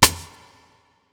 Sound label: Snare